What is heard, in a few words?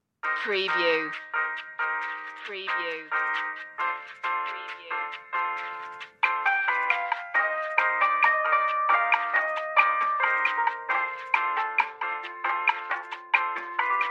elevator to heaven old speaker